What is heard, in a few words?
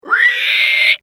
vox pig